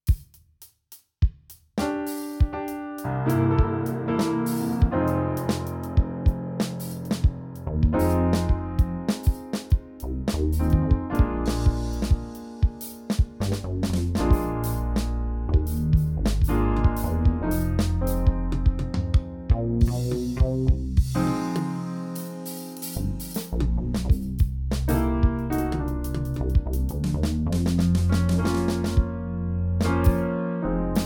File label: blues